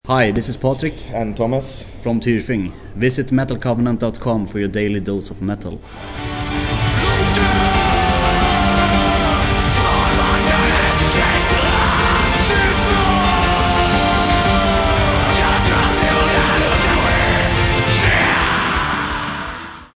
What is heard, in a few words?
jingle